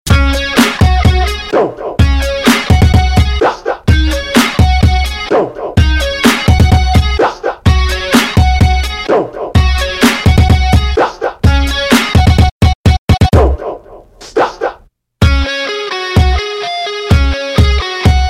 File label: remix, electronic ringtones